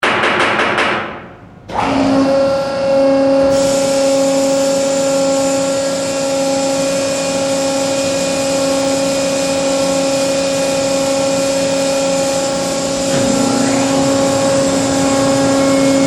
Ship Oil Pipeline Service Vessel Machine start and Stop